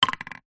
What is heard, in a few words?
sport table tennis ball throw into small wooden container